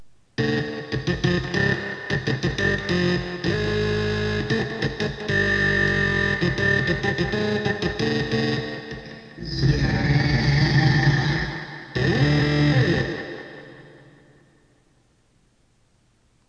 stylophone through octaver through ringmod through space echo; instant rockband